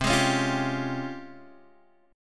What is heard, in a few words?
C sharp Major Sharp 11th C